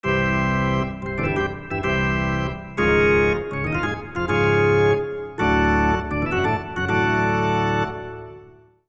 Organ Charge; Backyard Hockey

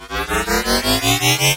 engine turn up

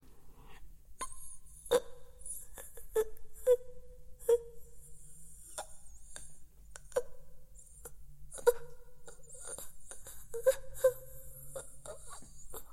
Death; Hornycraft

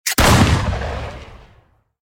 blunderbuss shot; out of reach treasure royale 2020 gamerip pc

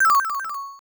Coin Sound

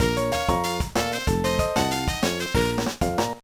Jingle, gba

